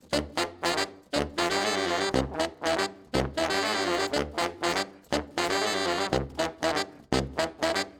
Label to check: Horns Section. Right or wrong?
Right